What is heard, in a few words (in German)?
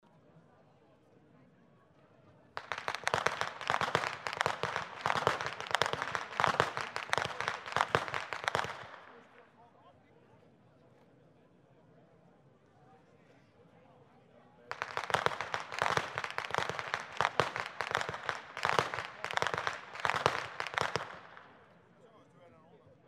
schnalzen, jugend; waging